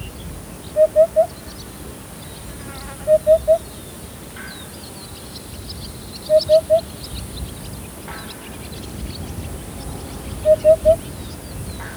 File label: Upupa epops